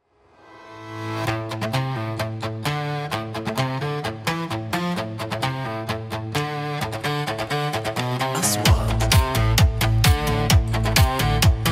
action

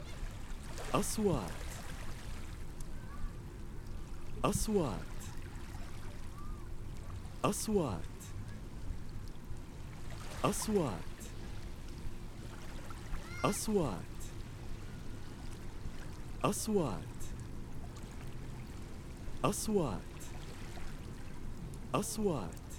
Beach Waves

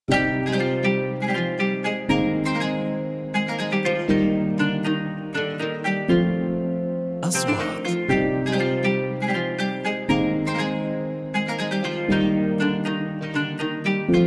Oud

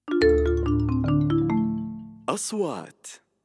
intro piano

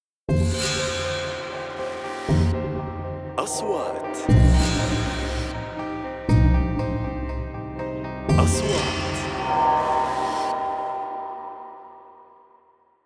intro ARP; intro arb

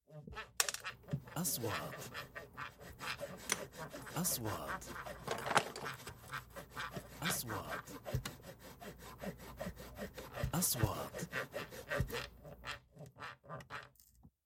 Wood

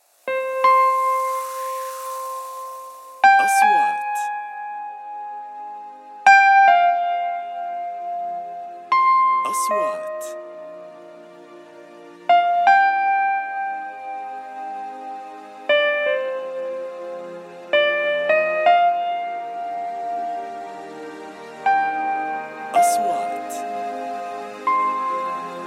Music

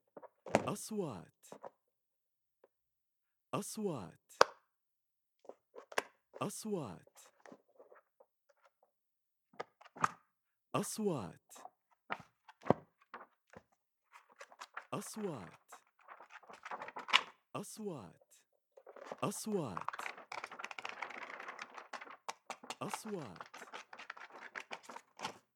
notebook open leather

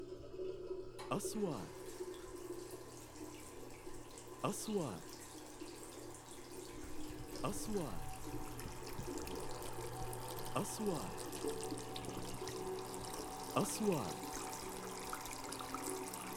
water shower hair wash face wash

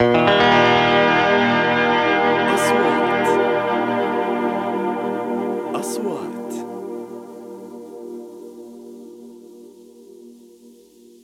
intro gt

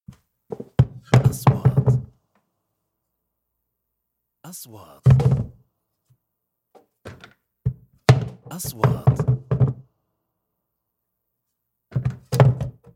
Wood

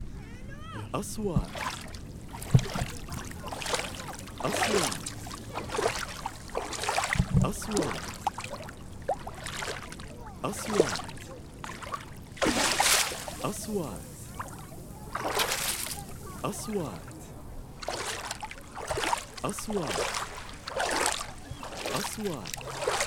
Walking on the beach

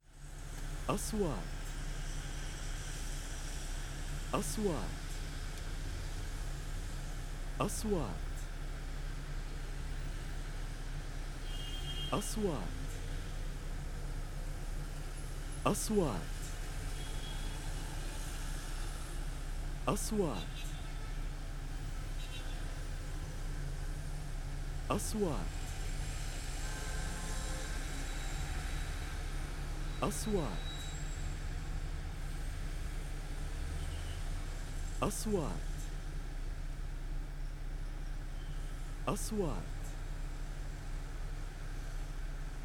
cars at traffic lights

